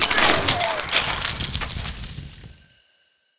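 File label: skeleton mage dead, monster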